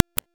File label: impact; Dante; Equip Noctua; Warframe